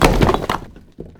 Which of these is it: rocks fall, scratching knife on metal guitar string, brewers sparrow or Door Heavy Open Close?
rocks fall